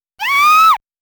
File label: ROUND CLEAR; Taito Digital Sound Archives Arcade Vol.4